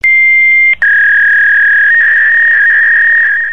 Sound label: Sound Modem